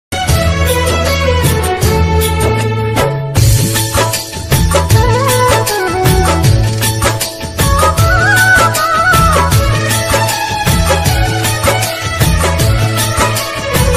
sad ringtone hindi ringtone

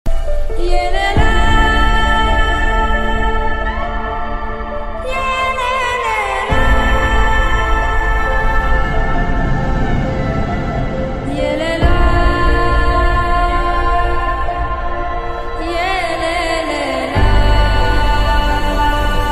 music ringtone sad bgm ringtone sad ringtone; antim movies

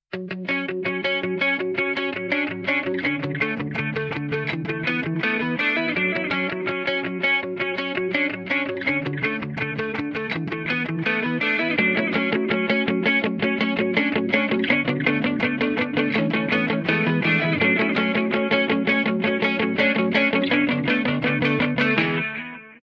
guitar ringtone

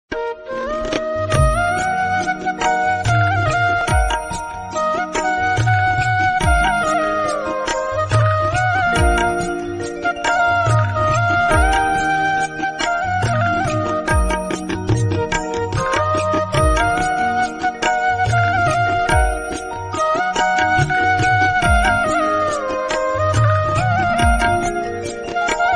best old instrumental ringtone old is gold

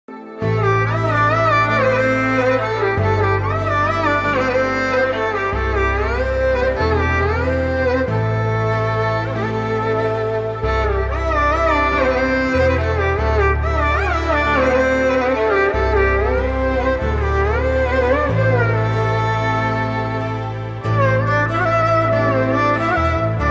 flute status tamil bgm instrumental ringtone; Janu movie

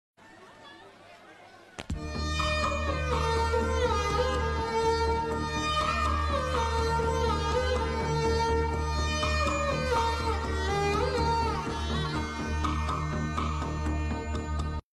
mother bgm sad; chatrapathi

